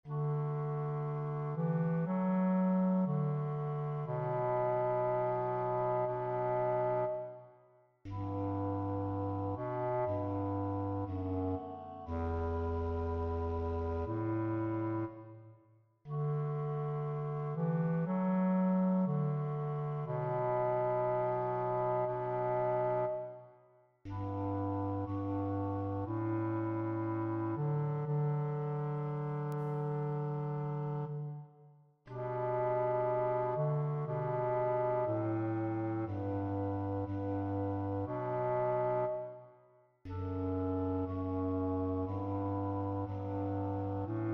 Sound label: bass; In the Bleak Midwinter (D)